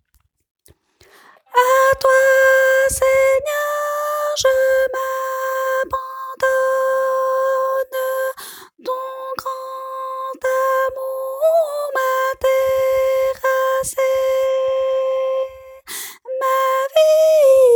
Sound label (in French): ténor